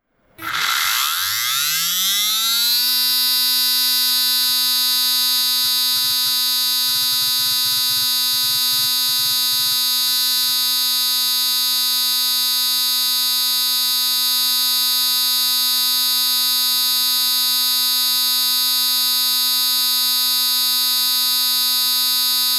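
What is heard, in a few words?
Fujitsu MBC2073RC SAS, festplatten geräusche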